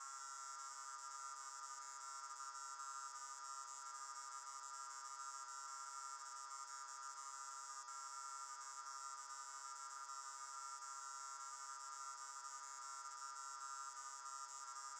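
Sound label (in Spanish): LUZ ENCENDIDA LOOP, light switched on loop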